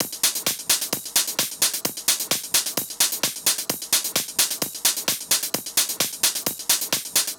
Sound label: Hihat Loop 130; Equipped Music; Loungin House; Hh-Shake Loops 130